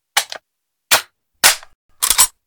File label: m1 carbine Reload; Modern Weapons Pack